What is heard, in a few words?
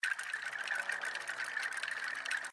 Spin; District Samurai